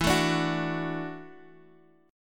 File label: E 7th Suspended 2nd Suspended 4th, down, Guitar, Standard; strummed; capo 9